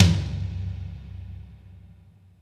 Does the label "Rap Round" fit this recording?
No